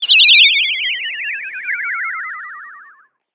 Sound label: falling